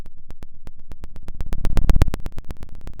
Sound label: Sound of a fire crackling